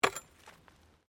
Digging Pick Concrete

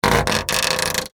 Industry Wood Crate Open Creak; Factories; Backgrounds

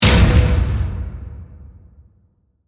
Hit Slam Hard Slow, Accent, Transition; Imaging Element; Production Element

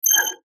Beep High Pitch; Beep High Pitch Fast Whoosh; Beeps; Multimedia

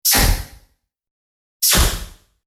Automatic Pressurized Door Open-Close; Airy Release; Automatic Door Trap Door Pressurized Door Airy Release Open - Close Scifi Science Fiction; Doors; BBFX

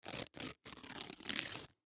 Bandage Tape Rip From Roll Slow; First Aid; Hospital; Emergency

